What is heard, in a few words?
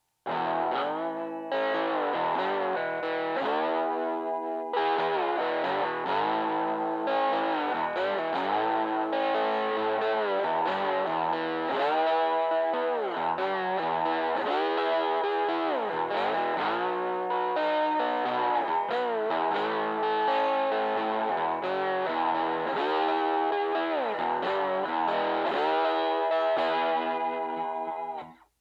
hodad pick slide gain